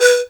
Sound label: PIPE B 3, REED PIPE; Africa; Spectrasonics; Heart of Africa 1